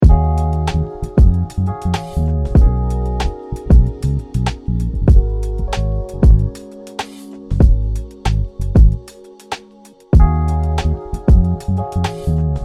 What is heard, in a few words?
neo soul rnb 95